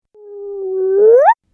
Cartoon Kid Prepares To Jump, Bounce Sound Effects